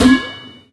phys bouncy cube

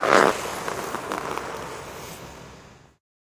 taunt skating scorcher stride; video games; source engine; tf

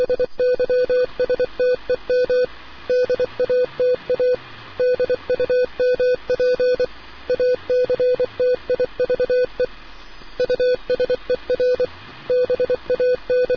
ambient; Portal 2; Source Engine; video games

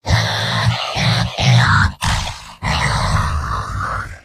heavy laughter big; mvm

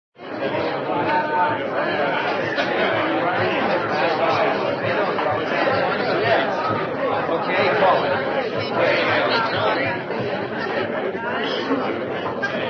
crowd, restless, restless crowd waiting; cartoon

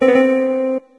leonardo da vinci beep; windows 9x; sound schemes

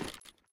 equipment jingle short; video games; player; taunt; source engine; tf

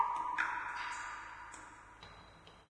ambient water drips; video games; nature; source engine; portal2

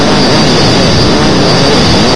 egon run, weapons; source engine; hl1

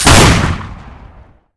scatter gun double shoot, weapons; bonk; tf

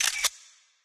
camera; Source Engine; tf

